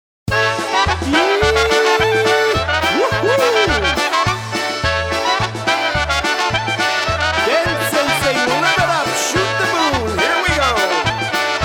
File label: Polka